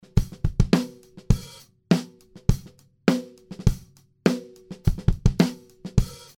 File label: Manley drums